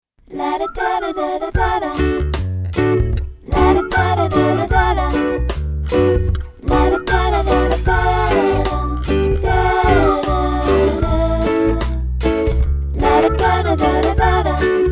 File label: Reggae